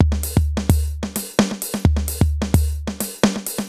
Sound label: Alesis HR-16B (130BPM), beats; musicradar; cyborg beats